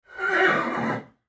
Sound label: Horse Neigh; Animal; Horses; Blastwave